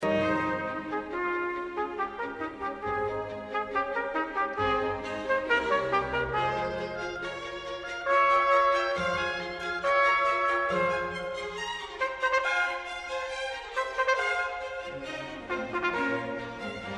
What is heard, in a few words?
trumpet concerto e flat major; haydn